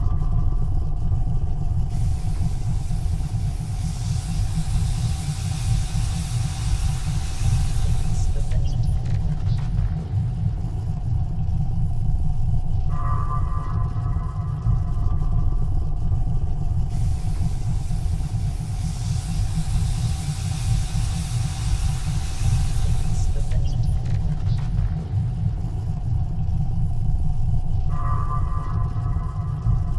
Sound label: ship science ship idle; Stellaris; machineage